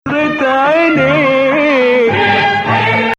Nawa Bayati

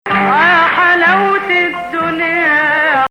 Ajam

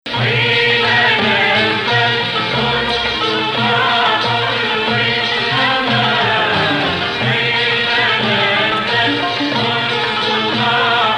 Sikah Jadaka 2nd Rast

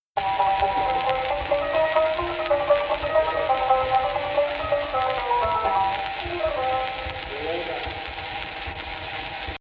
AHH, Egypt Rast; Jins Rast in the upper octave; Actual Key: Rast on C

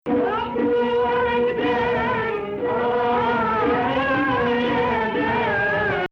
Rast Sihtu 2nd Rast; Maqam Rast

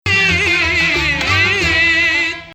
Nahawand

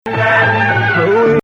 Nawa Athar Gameel Rast

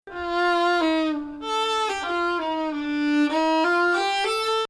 Bayati, Maqam Bayati; Bayati 1

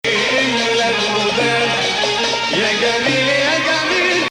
Bayati Nahawand

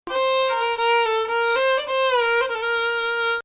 Bayati Samai Ajam 6; could make #60 retroactively feel like 2nd Ajam 3/6; Samai Bayati il-3ariyan

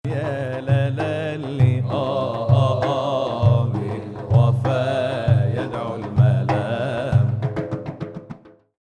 Ajam; Ajam, which resembles the Western Major scale, has two distinct versions

